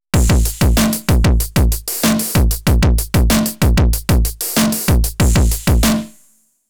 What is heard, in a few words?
elektron analog rytm acoustic drums tweaked